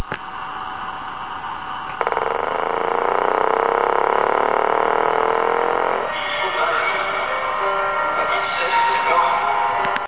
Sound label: turnon